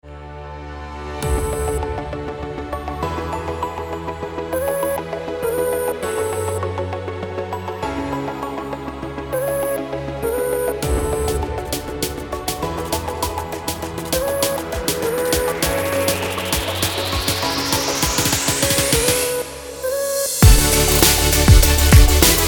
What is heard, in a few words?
Future Beat